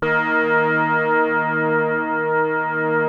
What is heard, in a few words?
PPG D#3; Hollywood Edge - Giorgio Moroder Rare Synthesizer Collection; PPG.2.3